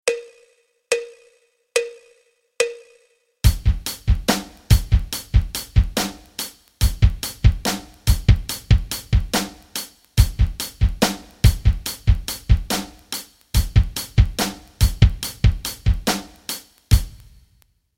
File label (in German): rock rhythmen